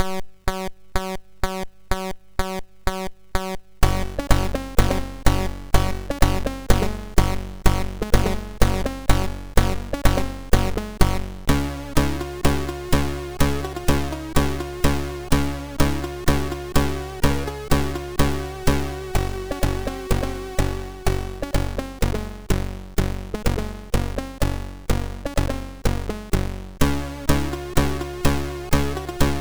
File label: sid MOS6581R4; Janosik Remix